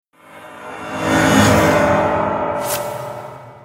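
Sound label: na sms, for sms, ringtone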